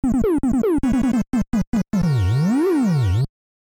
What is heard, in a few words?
Game Over; Mega Man IV